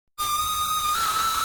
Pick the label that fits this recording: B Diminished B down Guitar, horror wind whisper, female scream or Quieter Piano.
female scream